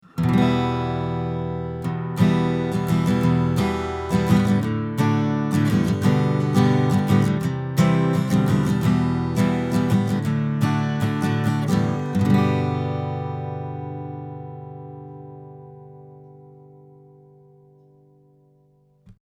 Neumann V476 Acoustic Guitar 20cm U87

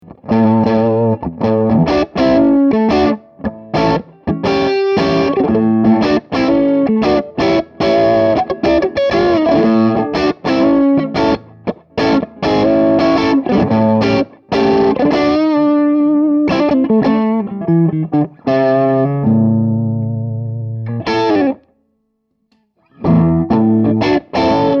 Crunch Humbucker

